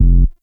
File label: Hip Hop Pack; Warframe; Bard; Bard Instrument Packs